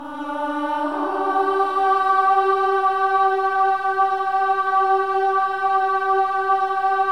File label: INTERVALS, Symphony of Voices; Spectrasonics